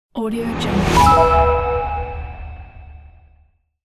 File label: confirmation logo